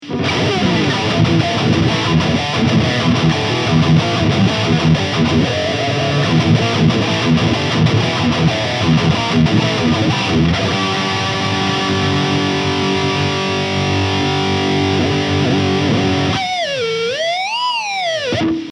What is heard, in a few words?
V Black Satin High Gain